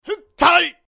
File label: tai voice sound effect karate figth manga; videogame; Sound-Graph